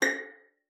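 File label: violin pizzicato A#6; Xsample VOL-5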